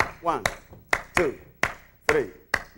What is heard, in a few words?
subdivision of 3 starting on second partial loop